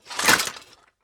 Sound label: flamethrower reload